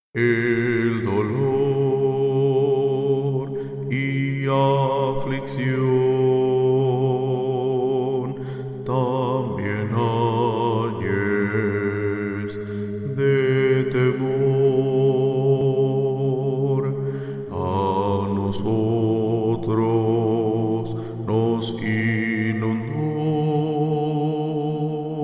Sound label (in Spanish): bajo